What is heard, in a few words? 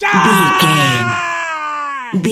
voice male battle shout long